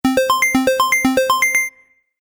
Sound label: Game App Tally Score, Video Game Sound Effects